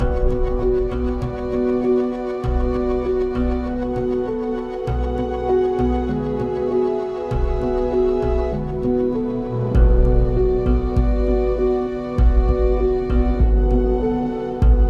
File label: Music Autumn Amb Night